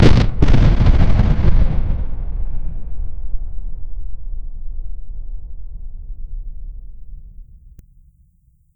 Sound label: create a audio, open field